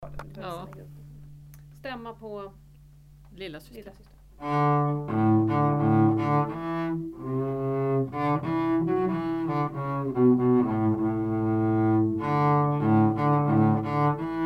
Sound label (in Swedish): Cellostämma